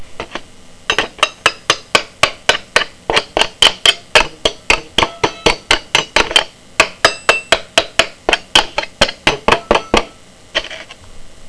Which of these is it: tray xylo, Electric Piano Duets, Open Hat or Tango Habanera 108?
tray xylo